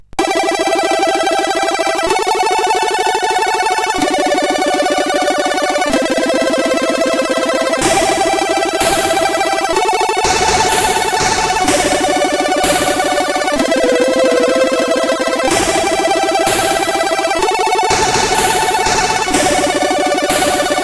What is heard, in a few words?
Techno sid CSG8580R5